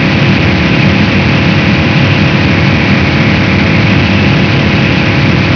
wind; World Racing 2

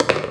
pocket; Virtual Pool Tournament Edition

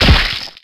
fall pungee; hits; Tony Hawk's Project 8; XBOX

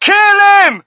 crowd, shout; Mike Tyson Heavyweight Boxing

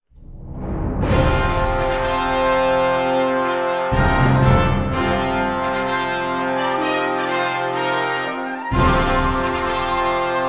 WIN; ArmedAndDangerous; Wrath

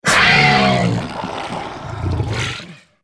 wraid dead; Star Wars Knights Of The Old Republic I; XBOX

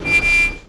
car brake squeal; auto; Tony Hawk's Project 8; XBOX

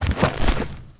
change shoes; Tony Hawk's Project 8; XBOX; bedroom

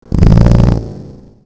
pipe; The Elder Scrolls III Morrowind GOTY; XBOX

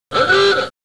Horn; TrueCrimeStreetsOfLA; XBOX

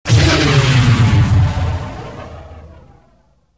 teleout

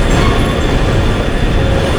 engine room; hongkong; ambience; Soldier Of Fortune II Double Helix; XBOX

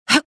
jump

